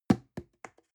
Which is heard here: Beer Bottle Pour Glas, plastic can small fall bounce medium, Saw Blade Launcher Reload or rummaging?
plastic can small fall bounce medium